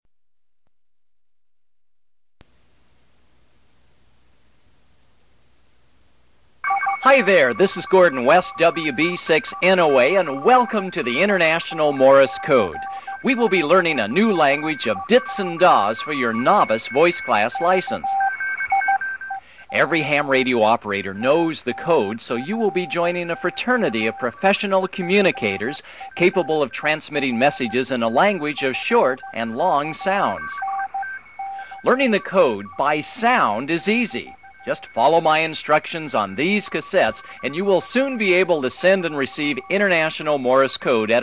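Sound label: international morse code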